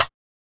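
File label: house rimshot; E-Lab; X Static Goldmine 1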